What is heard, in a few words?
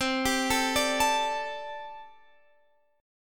D Suspended 4th C, guitar; Dsus/C; arpeggiated